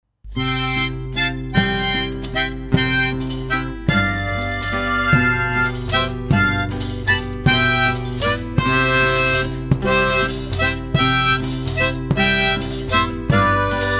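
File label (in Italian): Uh Madonna che succise a Manfredonia, canto e chitarra battente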